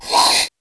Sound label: attack; Eft; Dead Messenger